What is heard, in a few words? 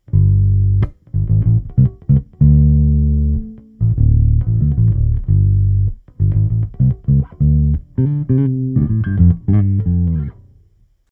Markbass MB58R CMD 102 Pure Dream P Bass EQ Mic